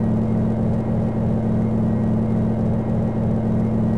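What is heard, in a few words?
mine Generator loop; machines mechanical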